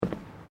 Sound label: Footsteps Boots Boardwalk Wooden Step; Footstep Foley; Human